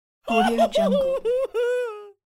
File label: male short terror scream